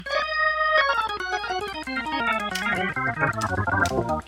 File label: big finish